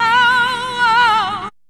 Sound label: OHOHOH; VOICES